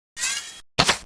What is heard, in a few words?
Lizardman Shoot Sound; Heroes3